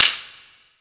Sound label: Slap, cartoon; Classic SFX; musicradar; cartoon caper